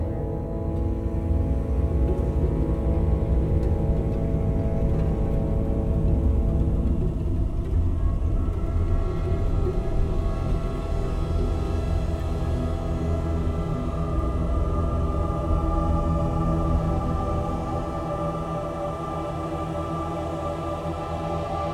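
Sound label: Mystery Suspense Loop; F14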